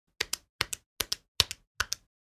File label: Casio calculator press buttons